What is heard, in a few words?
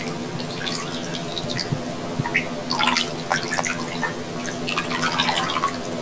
water light